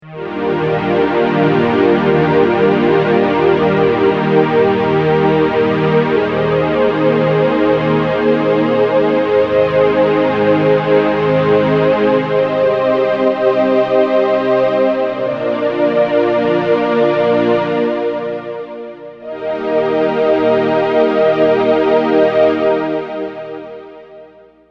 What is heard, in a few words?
PAD Oozing Warmth